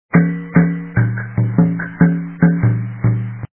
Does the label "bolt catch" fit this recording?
No